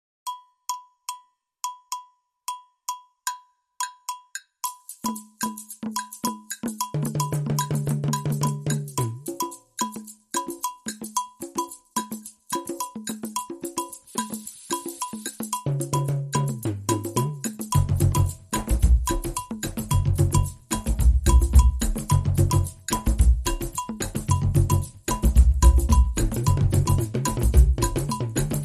Festival Drums

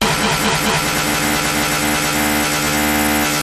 Glitch Lasers

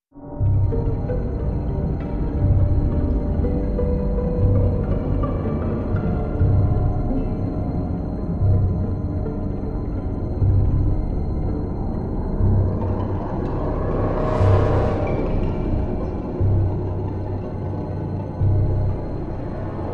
Thriller Noise

